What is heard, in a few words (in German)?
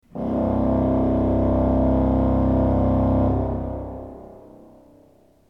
ton, orgel